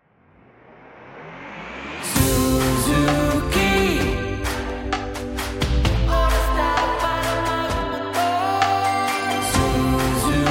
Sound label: jingle